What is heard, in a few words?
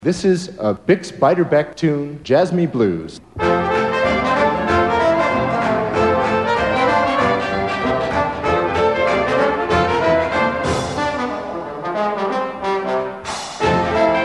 Jazz